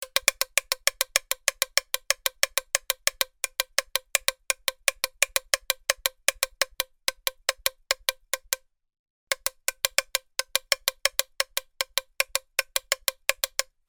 toy paddle ball small plastic cheap hitting twisting